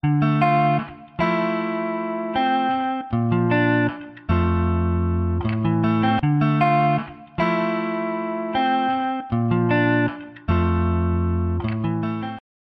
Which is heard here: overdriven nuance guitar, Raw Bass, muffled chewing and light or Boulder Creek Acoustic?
overdriven nuance guitar